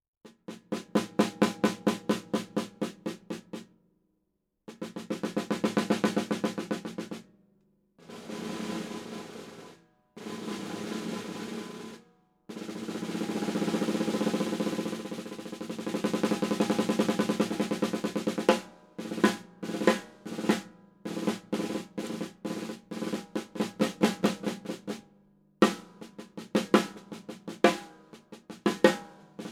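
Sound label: 4 ply Nat Lacquer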